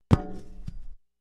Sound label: Head Hitting Metal; Impacts; 1001 Sound Effects